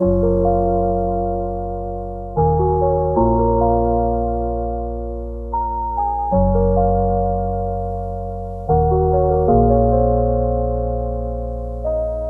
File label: Melody; Cymatics; Misty Eyed